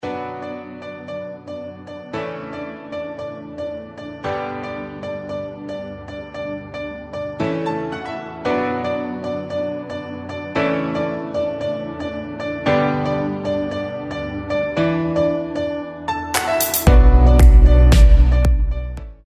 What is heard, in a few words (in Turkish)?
santral fon muzikleri